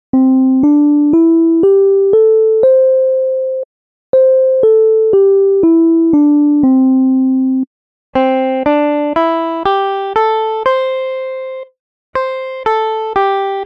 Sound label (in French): penta maj do